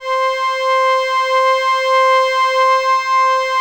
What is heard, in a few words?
LAMBDA, String Machines